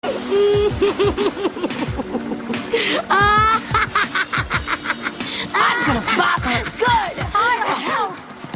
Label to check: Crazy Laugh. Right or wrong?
Right